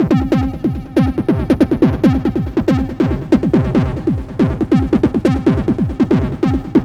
140 House Arp Cmin; Dance; DJ; Synth; Arps; Pro Tools Essential Loops; Digidesign

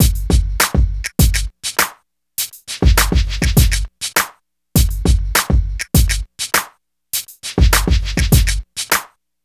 E Coast HipHop Drums; Digidesign; Pro Tools; Pro Tools Essential Loops; East Coast

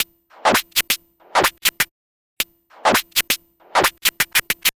Vinyl Scratch Clap

